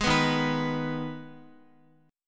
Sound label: Eb Suspended 4th Sharp 5th Eb Guitar 4E 4A 5D 5G 5C 6F; Ebsus4#5; strummed